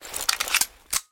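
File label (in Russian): перезарядка пистолета в засаде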